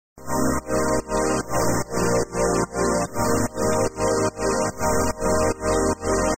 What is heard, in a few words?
synth chord 1 D; コードシンセは、メインに0’54～引き続き; エフェクト3にPhaserを挿し、OSC2をF1に、FILTER1のcutoff、resをそれぞれ上げ、プラグインでサイドを持ち上げ、ド派手な感じに仕上げています。; Synth Sounds1; Big Modular Patching